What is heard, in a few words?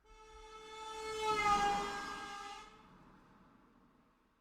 doppler passing by stationary